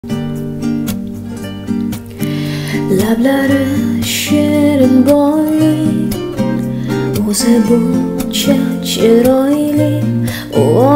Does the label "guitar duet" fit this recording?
Yes